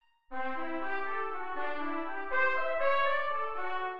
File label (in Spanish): Dominante inv0 en C; Bongamella